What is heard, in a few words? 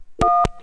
tone